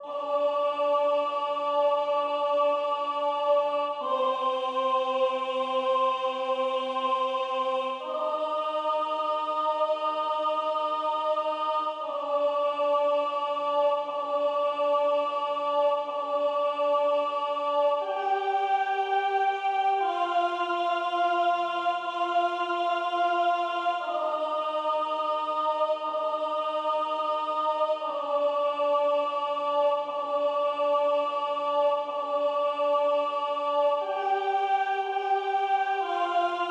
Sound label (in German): Gesang Stimme